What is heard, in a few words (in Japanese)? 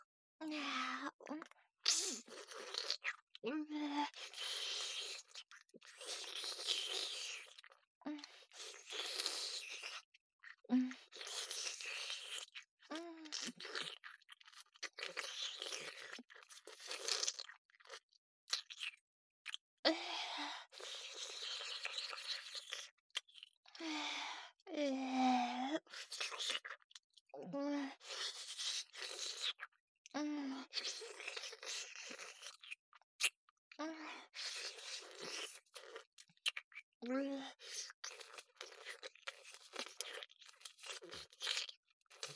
お掃除フェラ, ASMR; アドリブ集